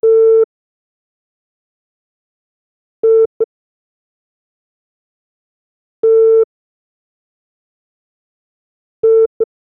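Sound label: a phone message notification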